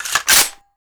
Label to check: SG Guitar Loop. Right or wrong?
Wrong